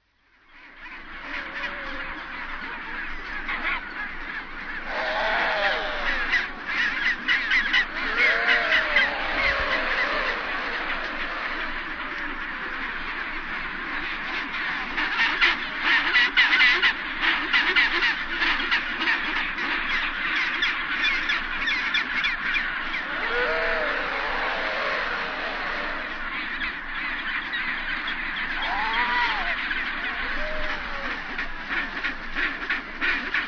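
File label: chinstrap rookery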